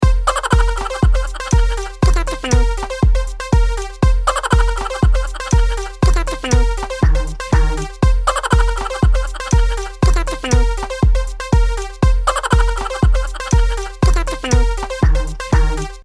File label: Crazy Chipmunk; Ringtones